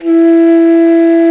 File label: BASS BANS, Bansuris; Winds; E-MU Producer Series Vol. 7 – Old World Instruments